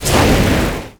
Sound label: Fire Shot